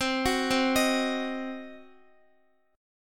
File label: C Diminished C, Guitar 4F,4A,5C,5G,5C,6E; arpeggiated; JGuitar